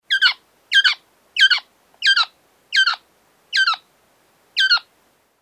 golos japonskogo perepela

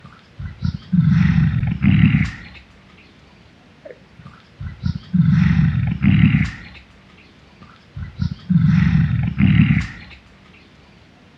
zvuk dyhanija gorilly

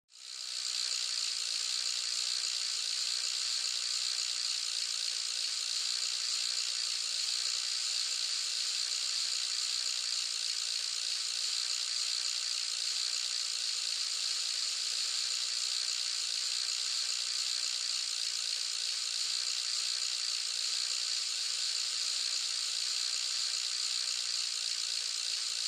treschetka velosipednogo kolesa

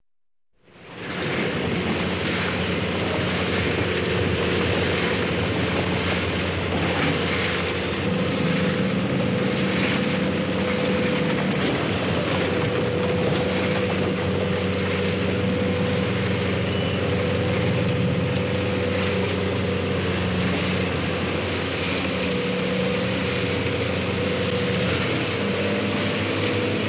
zvuk raboty kombajna

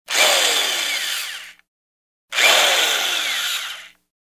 короткий звук дрели

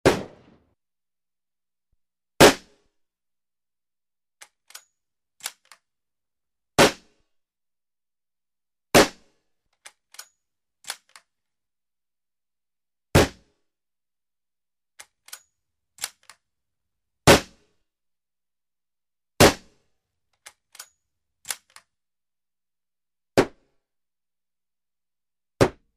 vystrel iz vintovki s perezarjadkoj

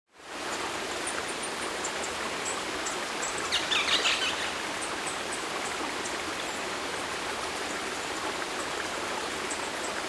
zvuk lesnoj reki i ptic

